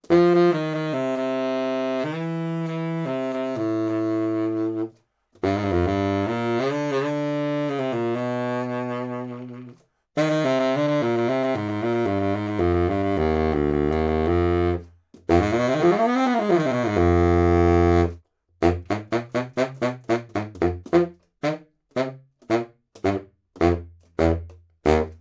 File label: Sax Baritone 50cm C Low